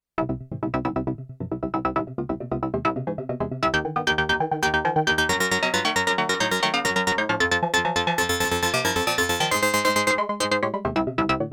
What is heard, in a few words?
microfreak V4 Presets